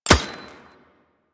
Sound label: Kinetic Plating Shield Hit; Warframe; Runner